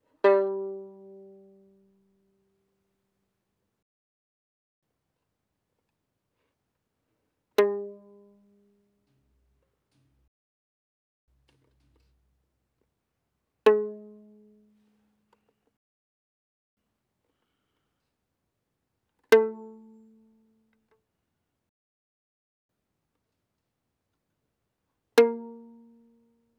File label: Violin pizz ff sul G G3 Bb3